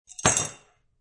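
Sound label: Metal Bar Tool Drop, Drink mixing tool drops on bar.; Bars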